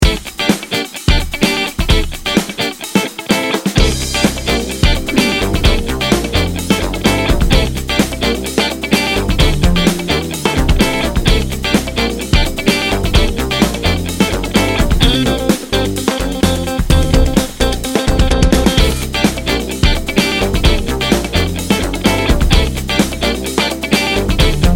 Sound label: funky bounce bed